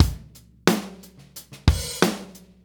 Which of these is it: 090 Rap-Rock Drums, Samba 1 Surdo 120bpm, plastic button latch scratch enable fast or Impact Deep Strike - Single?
090 Rap-Rock Drums